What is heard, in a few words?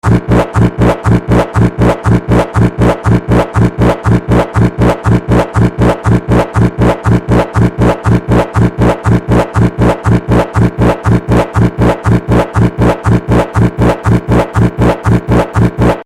Electro Matrix Loop, sci fi; Looping Sound Effect; Sci Fi Sound Effects; Fantasy, Horror And Sci-Fi Games; Andrew Fleming